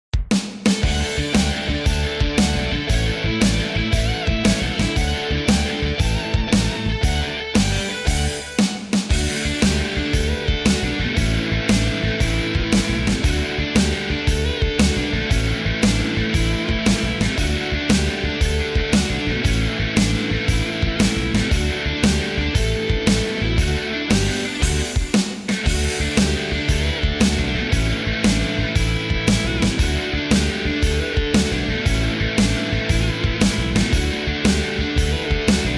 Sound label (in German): nur Begleitung